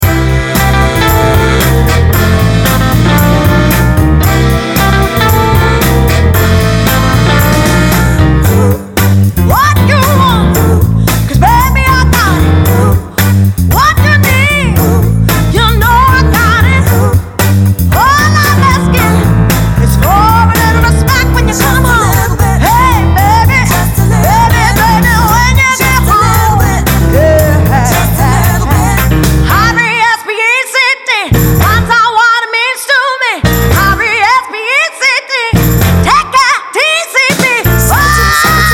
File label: Rock Pop Function Band; London